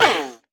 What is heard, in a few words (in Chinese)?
犰狳受伤声